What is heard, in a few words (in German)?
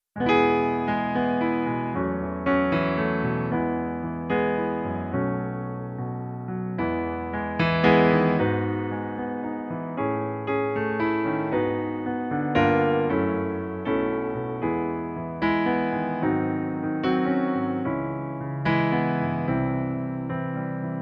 Piano Playback E-Dur